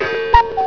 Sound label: CUKOO